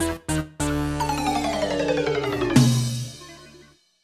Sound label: Breakout Mini-Game (Lose); Vexx; PS2; Xbox